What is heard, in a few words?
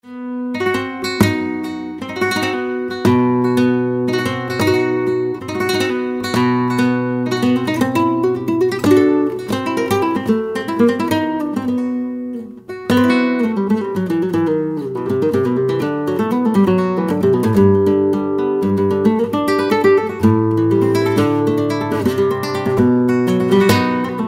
Flamenco Guitar